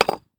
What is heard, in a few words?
brick hit; a dump in the dark